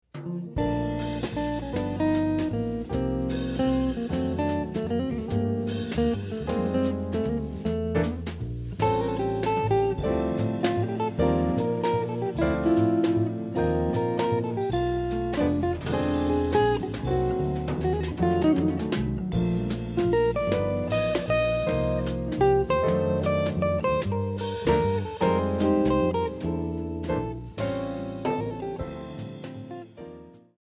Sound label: swing